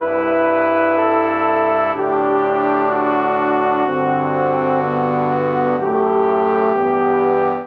Progression Powerful VI-VII-III-i-VI-VII-V-i E Minor 125 bpm, Brass Quartet; MIDI Clips; Ableton; Spitfire Audio